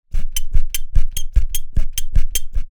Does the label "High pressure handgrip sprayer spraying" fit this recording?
Yes